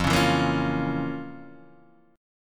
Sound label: F Major 7th Suspended 4th Sharp 5th F, Guitar Half Step Down